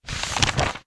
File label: page